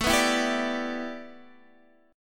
A 7th Suspended 2nd Suspended 4th A Guitar DADGAD, chord